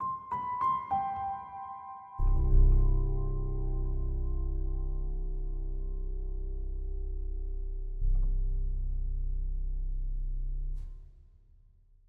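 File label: intro 100bpm C major F major; 18beats; rainforest; chicory a colorful tale; ps4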